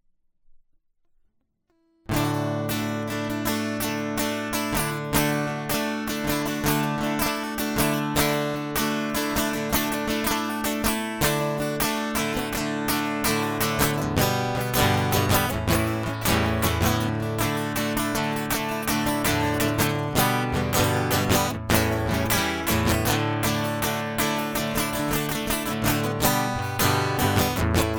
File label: Roam Home Guitar Loop; hyper terrestrial